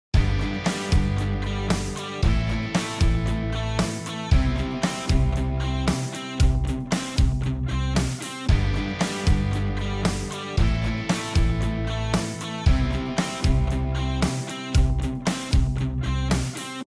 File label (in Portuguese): harmonia V vi I